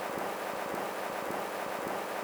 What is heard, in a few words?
Tape Noise loop